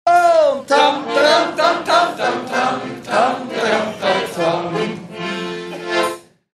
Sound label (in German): klingeldoon; tamtam